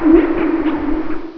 boid idle

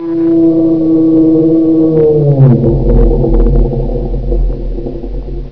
tentacle sing

